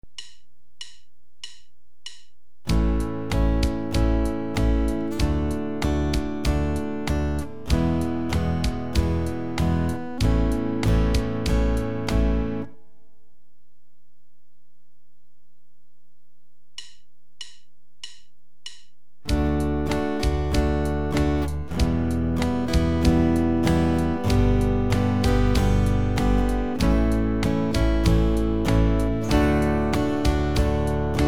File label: Chord progressions using A-, D-, G-, and C-family, guitar